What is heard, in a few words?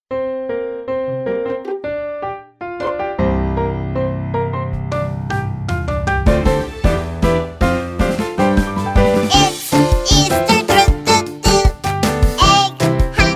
english songs for kids